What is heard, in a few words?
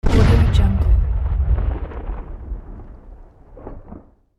gun shot heavy